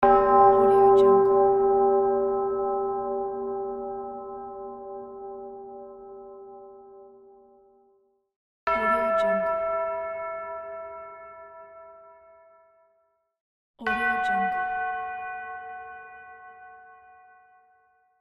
church bell; incredible-sound

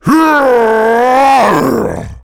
game character demon battle cry

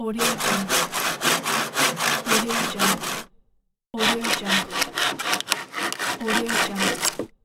saw; nektar pro

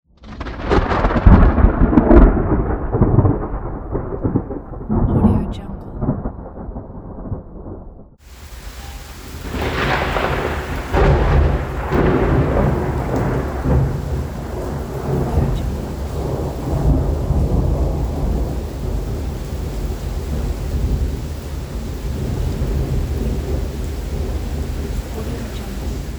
thunder

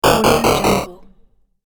crushed game impact descent

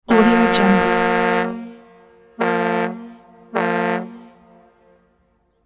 tug boat horn

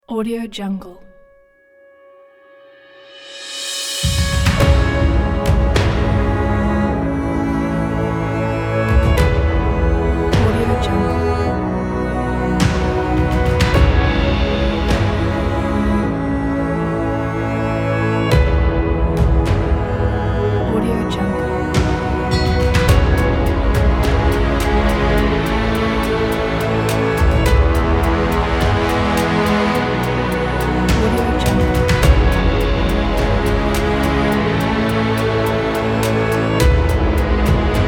movie kit

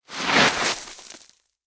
gravel slide

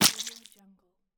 flesh movement

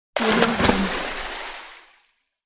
water splash

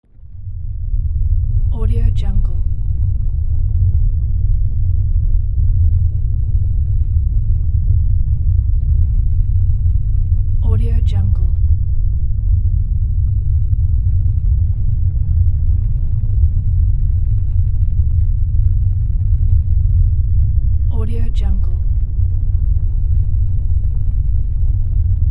volcano ambience

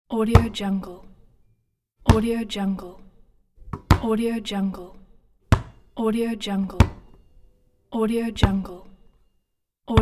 basketball bouncing on concrete outside; bestsfx

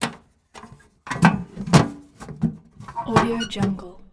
toolbox open; prosoundfx

